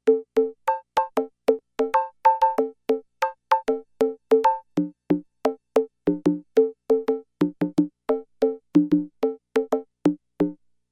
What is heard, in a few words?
Conga